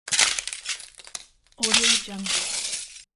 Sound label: footsteps on glass; klassikua